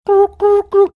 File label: attempting to make sound mario luigi going down pipe